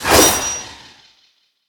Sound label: swing; smite; god daji skin15